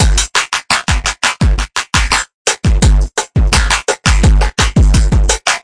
85 bpm, hard core clappy dirt, drum loops and breaks